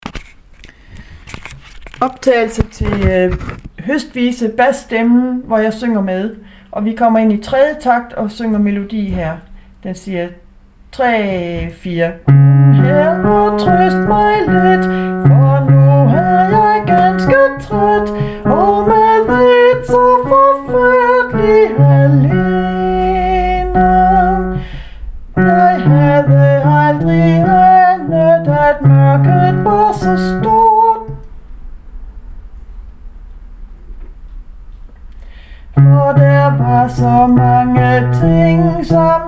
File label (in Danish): bas med sang; høstvise